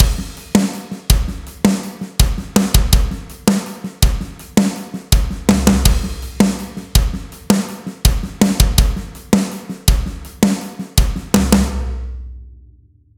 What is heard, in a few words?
metal kick on; df xcite 2; drumforge